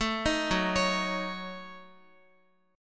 Gb Minor 6th, Guitar; Gbm6; arpeggiated; x,x,7,8,6,8

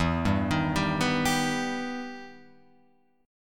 E Minor Major 7th, Guitar, Open F; EmM7 arpeggiated

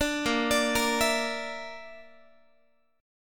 A sharp Major Flat 5th, Guitar; A#Mb5; arpeggiated

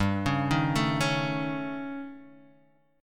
A 7th Flat 5th G

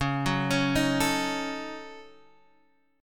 D sharp Minor 7th Flat 5th C sharp

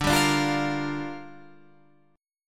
Bb 6th D

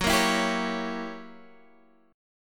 F sharp 7th Flat 9th F sharp down Guitar Open G

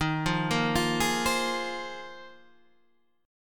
A sharp 7th Suspended 2nd Suspended 4th D sharp

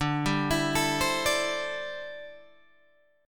D 7th Suspended 2nd Suspended 4th, Guitar Open G

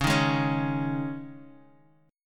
C sharp Suspended 2nd C sharp, chord

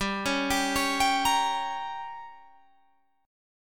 G Diminished G, Guitar Open G; 17,18,17,18,20,20; down

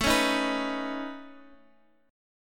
C sharp 7th Suspended 2nd Sharp 5th A

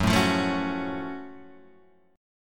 F sharp Minor Major 7th F sharp Guitar Open G

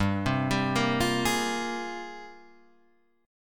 G sharp Major 13th

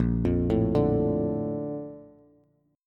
Bb Major 7th, Bb, down, Bass; BbM7 arpeggiated; JGuitar

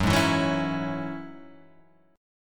F sharp 7th Suspended 2nd

